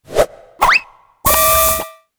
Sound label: Character Attack